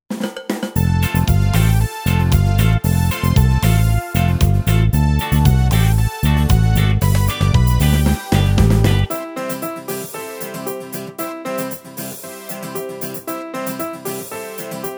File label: Karaoke